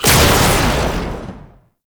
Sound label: Sniper Shot; Weapons; Warframe; Sentient; Caliban Prime Sniper